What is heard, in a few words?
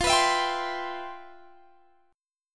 C Major 7th F; Cmaj7/F; strummed